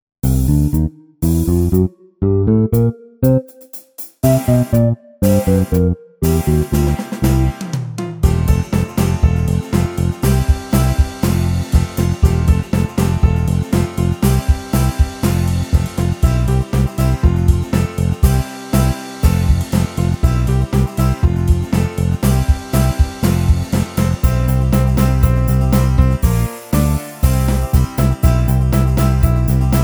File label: Karaoke